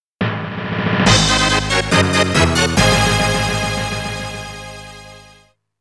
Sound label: Boss Battle Victory; Ganbare Goemon Sound Treasure Box